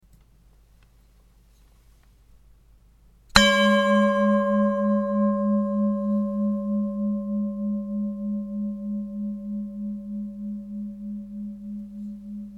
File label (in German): Klangschale; 464g